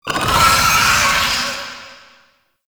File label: Summon Vocal Verb; Warframe; Uriel; Gulphagor